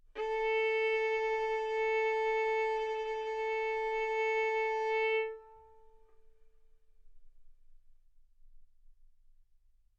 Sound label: Violin arco A4 B4; mf; sul A; stereo